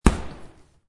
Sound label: tennis shot